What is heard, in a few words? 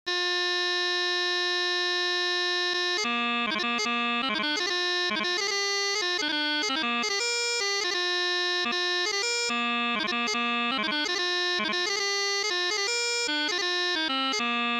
Chanter